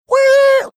making squeaking fan belt sound

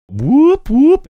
whoop whoop

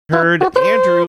mouth trumpet fanfare

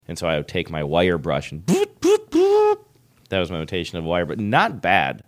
imitation of a wire brush sound

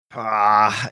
aaagh

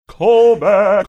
singing call back

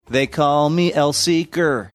singing they call me

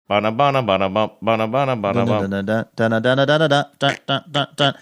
singing song

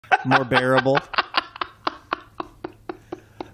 awesome laugh

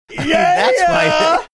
yeeeeaah

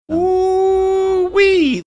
ooowhee

